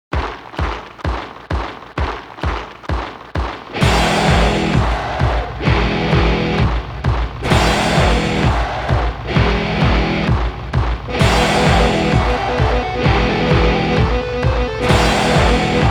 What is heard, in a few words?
theme tune